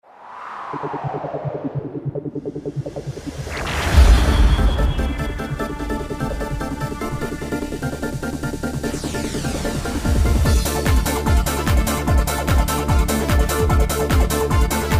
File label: trance